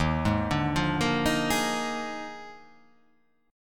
Eb Major 7th Suspended 2nd Suspended 4th Eb; arpeggiated; EbM7sus2sus4

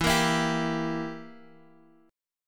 E Diminished E down Guitar Standard; Edim strummed; JGuitar

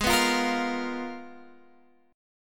G sharp Minor 6th Add 9th; x,x,x,13,11,12,12,14

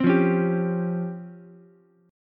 Db Major Sharp 11th Db down Ukulele C6; strummed; chord shape; JGuitar

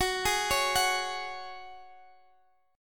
Gb Suspended 2nd, Guitar, Standard; Gbsus2; arpeggiated; x,x,16,13,14,14; down; capo=3

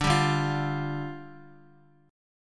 D Minor Double Flat 5th, down, Guitar

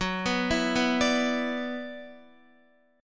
Gb 7th Suspended 4th Sharp 5th Gb Guitar; arpeggiated; JGuitar

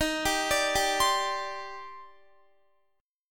Eb Major 13th Eb down Guitar; arpeggiated; chord

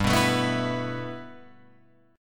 G 6th Add 9th G Guitar Open F, chord; G6add9 strummed

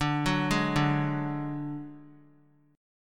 D Major Flat 5th D, chord; arpeggiated; JGuitar

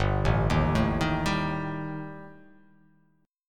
G Suspended 2nd Sharp 5th G

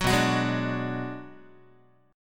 B Minor 6th B, Guitar; Bm6 strummed; 9,7,9,6,6,x; JGuitar

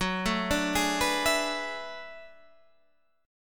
B Minor 13th Gb

